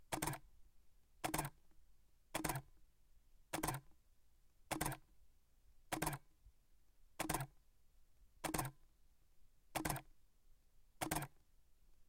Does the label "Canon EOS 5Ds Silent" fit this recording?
Yes